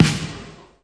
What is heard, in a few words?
Snare; Vengeance Samples